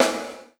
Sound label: GATE SD, Drums Acoustic; USB Soundscan vol.10; AKAI; 90s sample CD; ALL SD